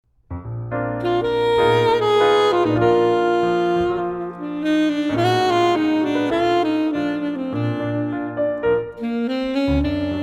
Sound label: Sax